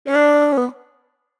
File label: yahh deeper